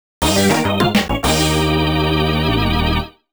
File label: Mission Success - A Rating; Mario Kart DS